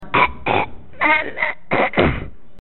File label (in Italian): vomito